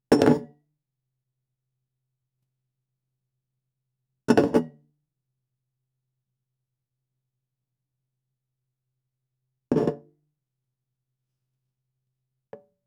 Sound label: placing a package of pank; domestic